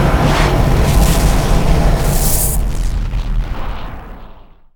jellyfish sphere; Drunken Robot Pornography; Inago Rage

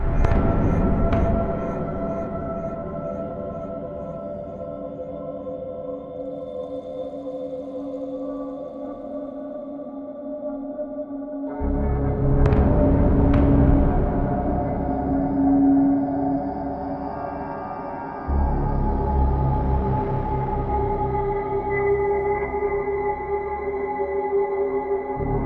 Bandit Cave Tension Music; OST Borderlands

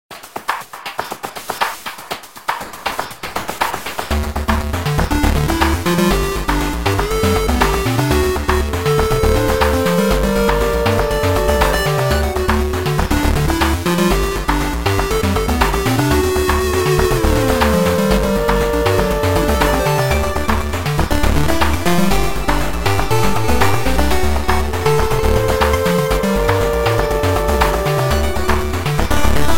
8-bit Coming Home; COD - Zombies

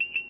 siren; Junkbot

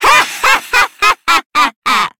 Laugh

